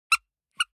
object toy squeaker squeeze with release soft slow; smartsoundfx